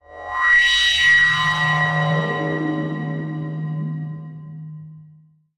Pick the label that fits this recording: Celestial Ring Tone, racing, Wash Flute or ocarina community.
Celestial Ring Tone